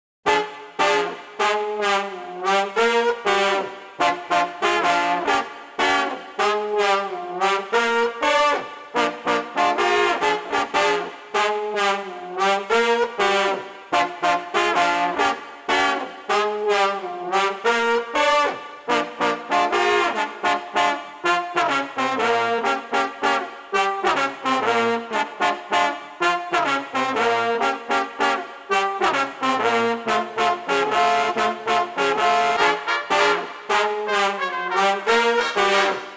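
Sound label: Street Brass Upbeat Vibe, 96 bpm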